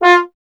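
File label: bass trombone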